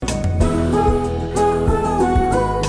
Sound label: Game over sound